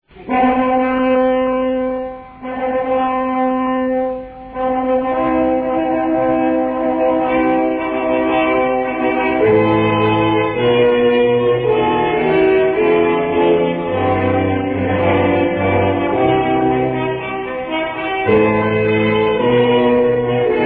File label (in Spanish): mendelssohn marcha bronces; Marcha Nupcial –Mendelssohn1